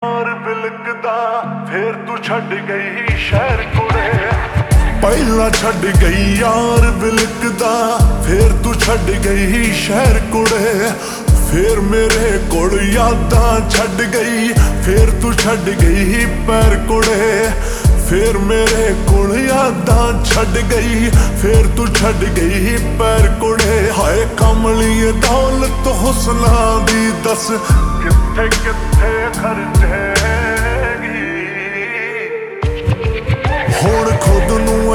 Slowed Reverb

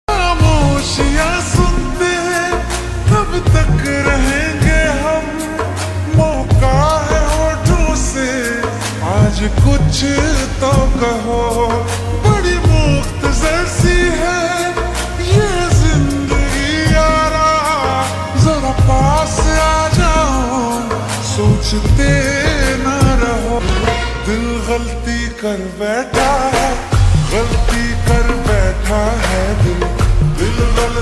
Ringtone Slowed Reverb

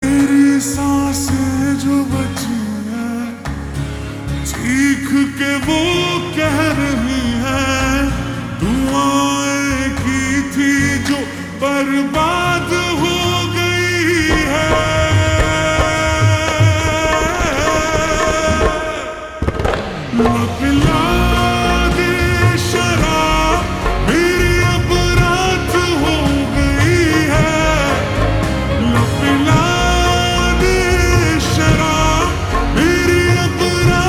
Ringtone Slowed Reverb

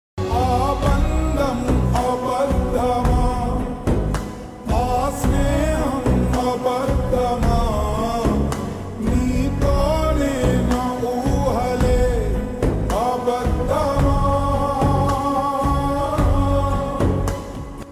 Slowed Reverb, telugu; Love Reddy

